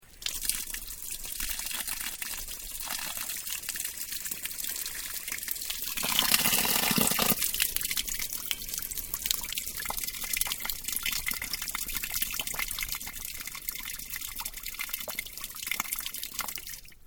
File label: PLAYGROUND WATER TAP RUNNING; Various playgorund equipment; Playground Sound Effects